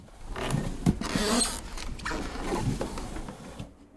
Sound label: hop on desk